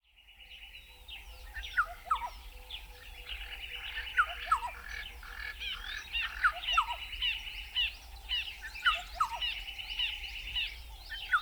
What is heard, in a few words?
Black-headed Oriole (Oriolus larvatus)